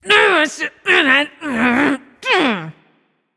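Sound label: grumble, female voice; smite; voicepack; hera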